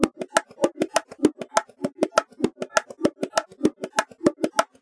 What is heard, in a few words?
Bongo